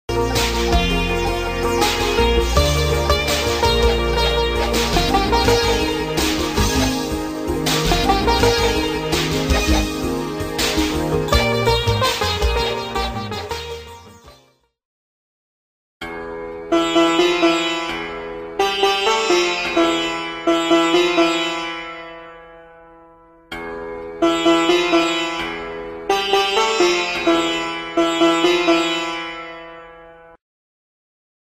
Indian Sitar; VST Plugin; Syntheway

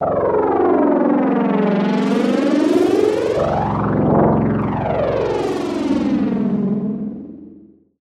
SFX Glider Takeoff; Phasewaver; syntheway

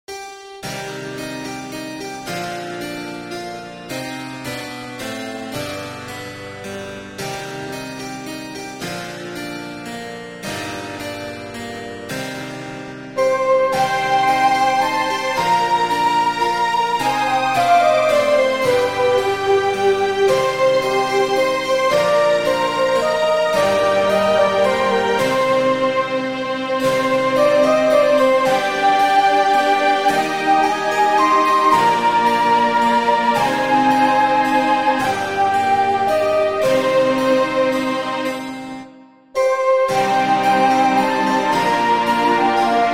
Harpsichord, Flute, Strings, Brass; VST; RetroMagix; Syntheway

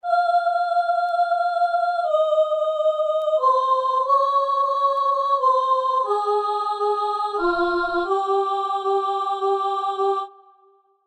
Celestial Choir; Syntheway

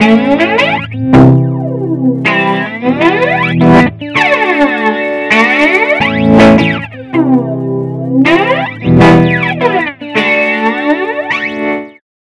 Stereo Flanger, Through Zero Sweep, Guitar, Wet; Sonicircuit; Syntheway

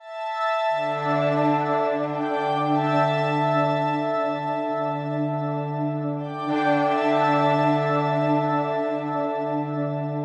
Oscillator PAD; Alphatron; Immenseness; Syntheway

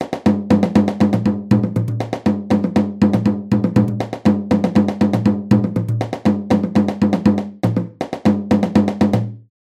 African Bendir C4 B5; Drumwavy; Syntheway

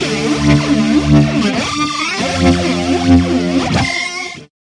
Stereo Flanger, Underwater Phaser, Guitar, Wet; Sonicircuit; Syntheway

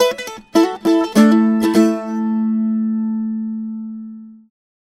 Theater Reverb Mandolin Dry; Echo Chamber; Echo Chamber is a stereo reverb and delay plug-in; Syntheway

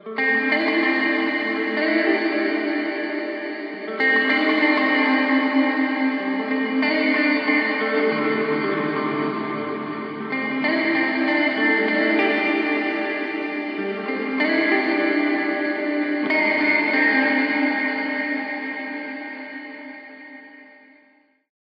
Acoustic Resonator Wet; Virtual Room Reveb; Syntheway

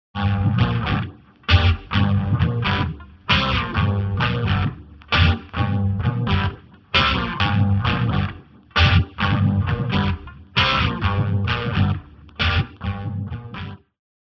Phaser Sparkling Waves Guitar Wet; Chromacycle; Syntheway

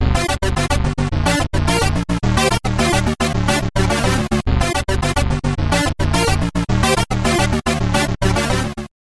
Oberheim OB-Xa Bass; Bassesland; Syntheway

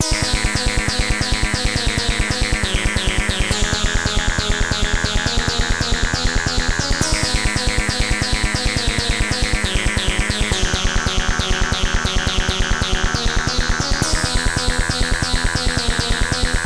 Korg Minilogue Dirty Bass; Bassesland; Virtual Acoustic, Electric and Synth Bass KONTAKT Sample Library for NI KONTAKT; Syntheway

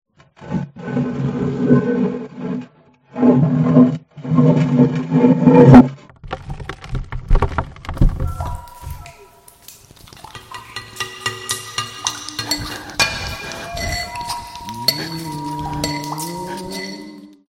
Crypt Echoes Dry; Echo Chamber; Echo Chamber is a stereo reverb and delay plug-in; Syntheway

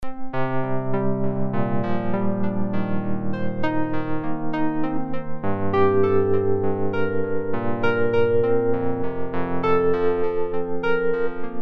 EP Wurlitzer; ElectriKeys; Ancestral Amphoras Enigma

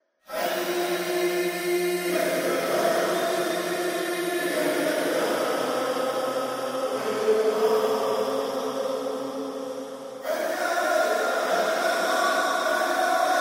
Echo Chamber Canyon Acoustics Wet; stereo reverb and delay plug-in; Syntheway

